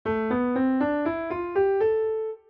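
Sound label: scale A minor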